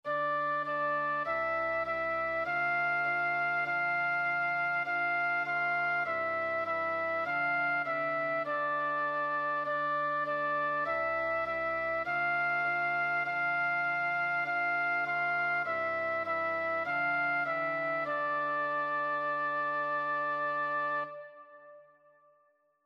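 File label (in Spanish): Dioses del Olimpo flauta alto; 5º Antiguas Culturas, 5º Historia y Narraciones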